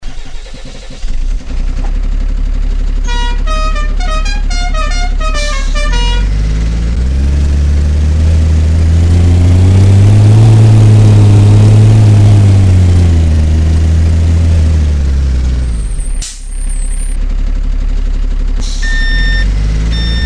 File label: Truck IVECO 140 E 30; Soundbeispiele